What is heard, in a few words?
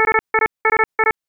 High, alarms; J Edworthy 2019